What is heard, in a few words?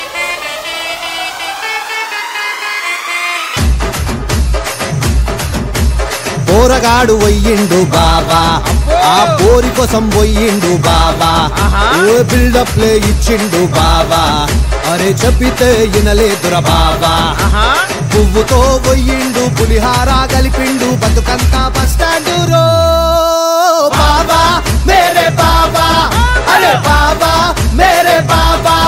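ringtone, telugu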